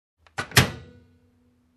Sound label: Kitchen Cabinet close